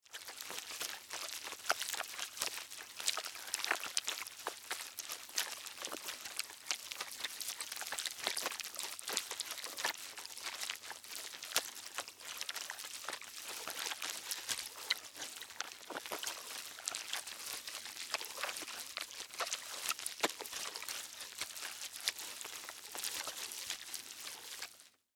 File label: Zombies Feast Eat, Horror, Corpse, Flesh, Wet; Monster; BlastWave